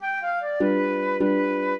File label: trio, flute harp; Mozart